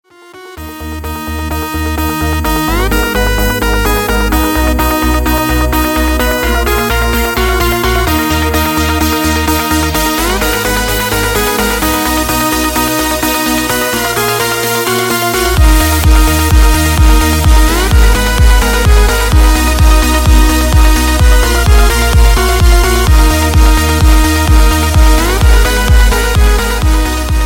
iphone 7 spaceman ringtone

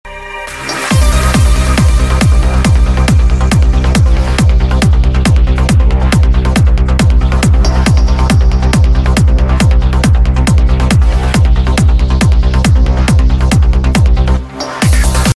iphone 8 ringtone

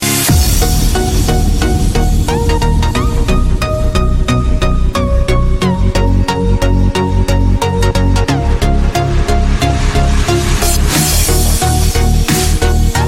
best english ringtone

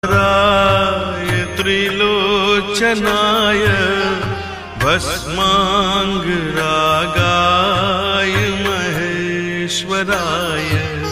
sanskrit ringtone; shiva

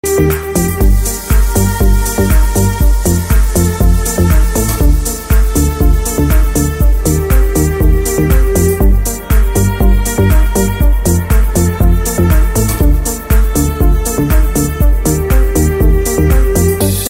arabic ringtone

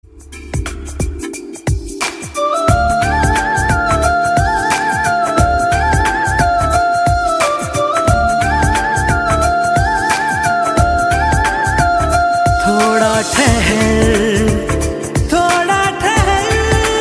hindi flute ringtone

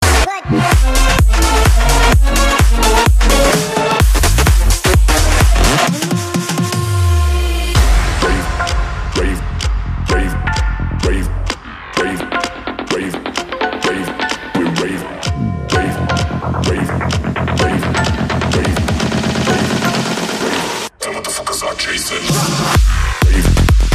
electro ringtone

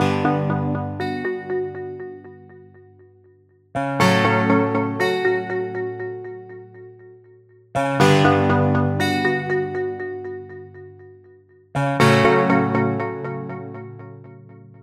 late night samsung edge ringtone

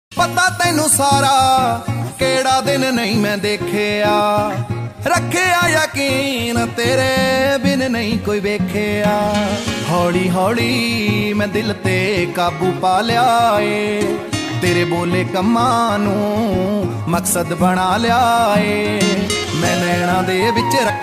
punjabi ringtone

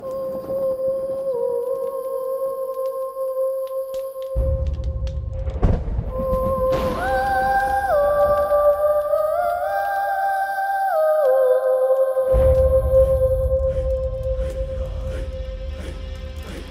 telugu tone ringtone

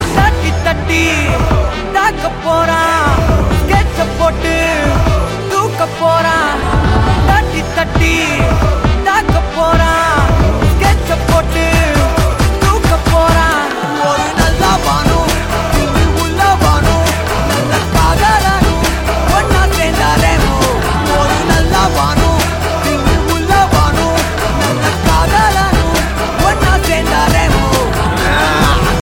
tamil ring ringtone

